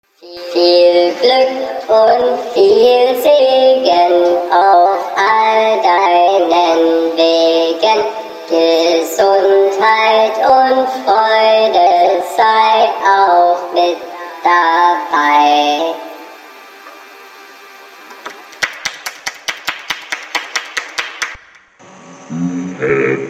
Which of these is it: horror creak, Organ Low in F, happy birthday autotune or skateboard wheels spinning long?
happy birthday autotune